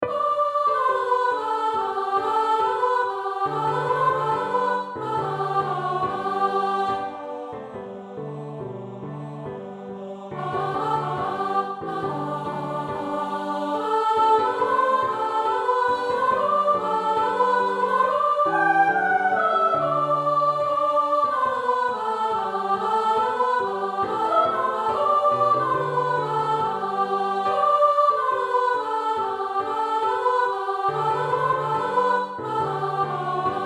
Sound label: Soprano; Deck the hall